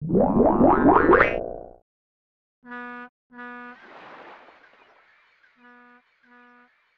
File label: Full Power!; Spider-Man; SNES